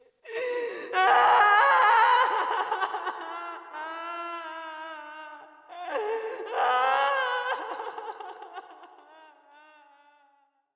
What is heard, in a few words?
Cry Woman Effects Fadeout Short